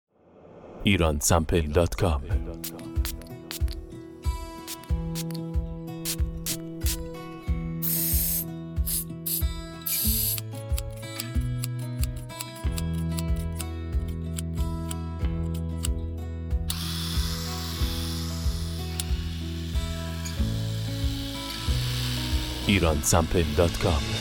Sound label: makeup sound effect